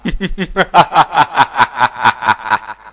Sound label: laugh; monsterz